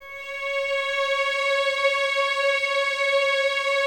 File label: C#5, String Ensembles Violins; Miroslav Vitous; 11 Violins